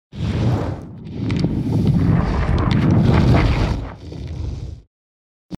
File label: Fire element spell